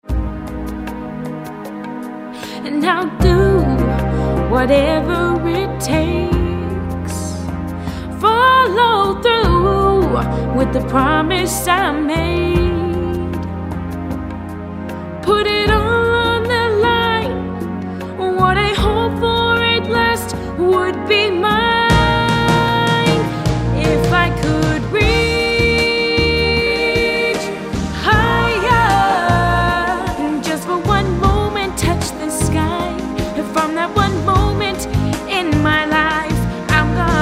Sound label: singing